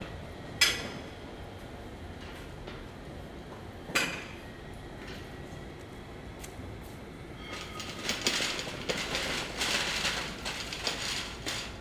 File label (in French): Nantes - Matin - Camion Nettoyage - mise en place des chaises de terass; terasse bar; Ville; ZoomH2N